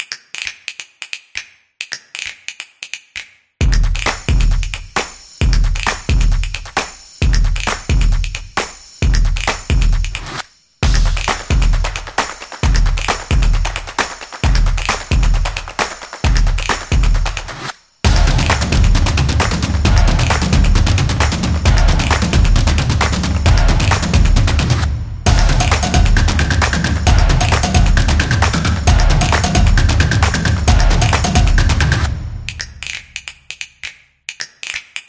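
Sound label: The Clap Dynamic Intro, Sport percussion background music created for action, competition; sports beat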